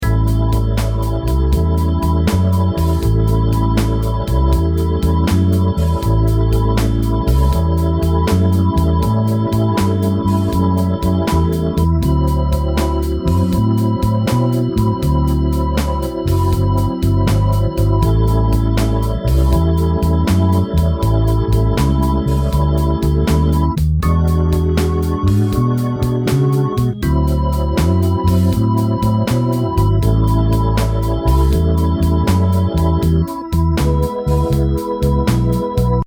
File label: 12 Bar Blues Shuffle A, loops